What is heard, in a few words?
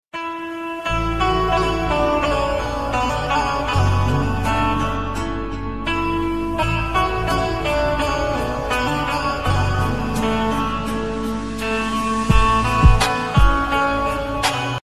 ringtone instrumental ringtone ram ringtone